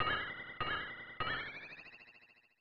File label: Banging Pans; Motoko-chan no Wonder Kitchen; snes